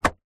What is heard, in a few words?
transport car door lock or unlock internal toyota corolla